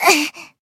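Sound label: damaged, Japanese; nagi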